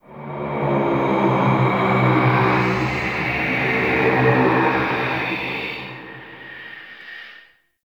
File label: Ghostly Whoosh; Ambience; Man In The Wall